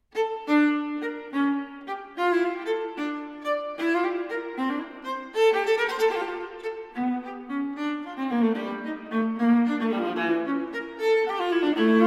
Cello Suite No. 2 in D Minor, for Viola, Gigue; J.S. Bach Cello Suite No. 2 in D Minor, BWV 1008 (Transcr. for Viola) VI. Gigue

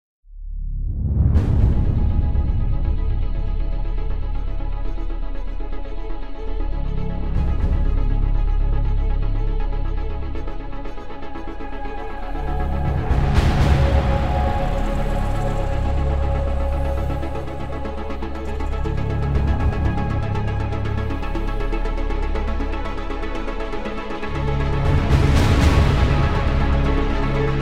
No Choir - No Solo

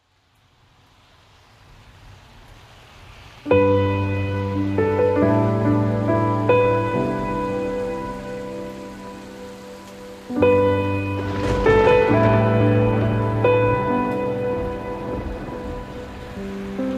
Piano and Rain

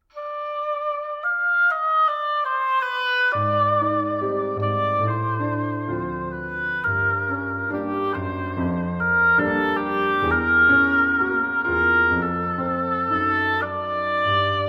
Ponteio No. 44 Desconsolado For Oboe and Piano; Arr. For Oboe and Piano By Osvaldo Lacerda

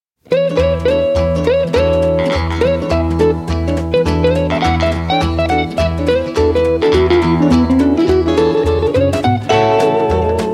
Christmas Guitar Boogie

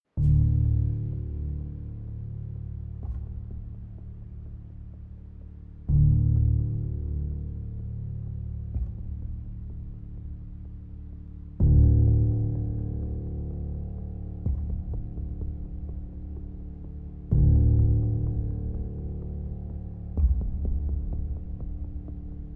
Piano Version

